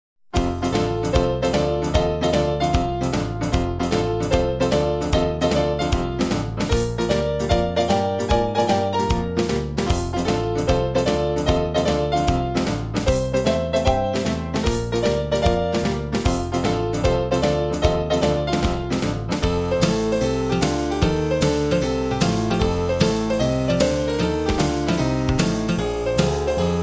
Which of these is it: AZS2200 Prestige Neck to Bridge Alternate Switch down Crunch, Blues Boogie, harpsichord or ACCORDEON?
Blues Boogie